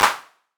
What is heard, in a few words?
clap